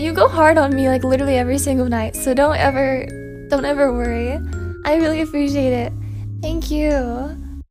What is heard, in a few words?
uuh